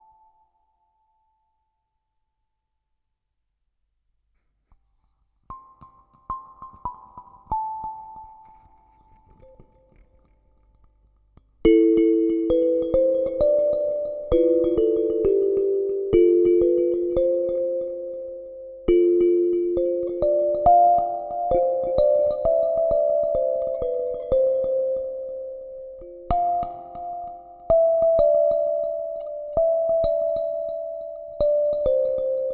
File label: Electric kalimba